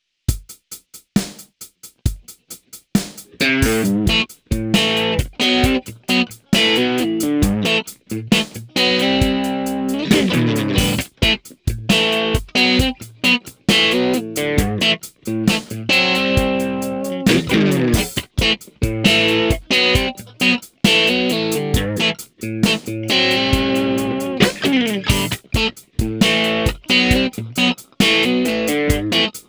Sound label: Vox AmPlug Rhythm Pattern